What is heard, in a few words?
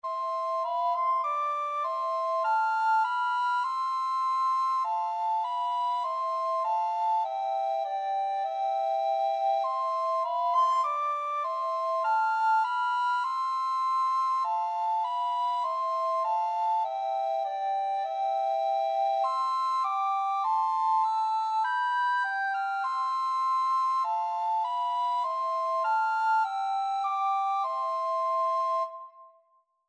recorder duet